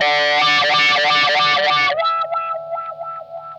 OVER WAH, Funk Guitar; 90 s Sample CDs; Zero G

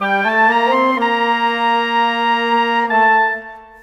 Winds, Orchestral, Rock Pop

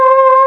ANGELVOX

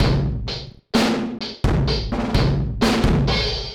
Tree Tramp Loop, Drum Loops Distorted; Zero-G Smoke

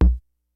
KICKS; Kid Nepro; Elite; KICKS SNARES

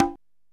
DRM; Best Service ProSamples vol.55 - Retro Sampler; AKAI; EMU SP12+120

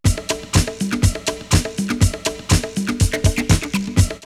drumloops, OldSchool; X-Static Goldmine; 90 s Sample CDs

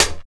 HAT 2; East West - Phat & Phunky

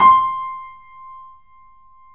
BPM L5 C, The Piano; InVision Interactive

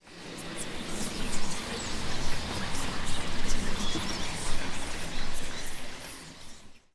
CHATTER

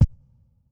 Kit, breakcore Drum Hits

